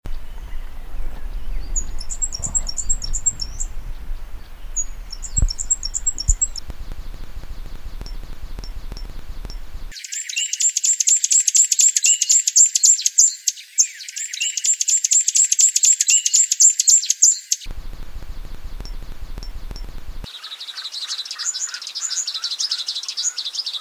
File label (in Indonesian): suara red bishop